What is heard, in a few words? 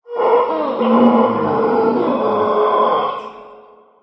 death howl; smite; scylla